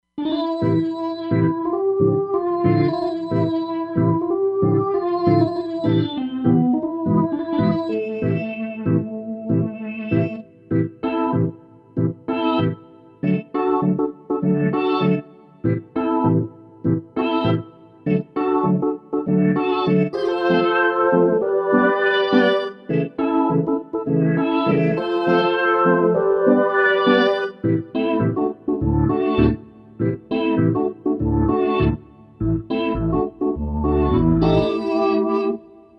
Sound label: Phono Organ, Roland Fantom XR